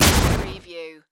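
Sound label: Production Element Hit Distort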